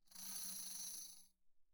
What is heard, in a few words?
tambourine thumb roll pp; Percussion